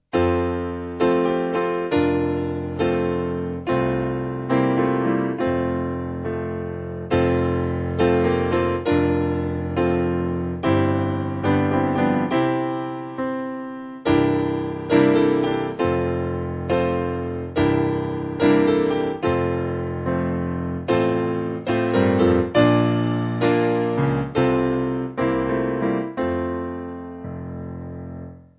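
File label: Piano, Music Backing Files for Churches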